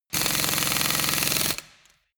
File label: impact wrench screw single long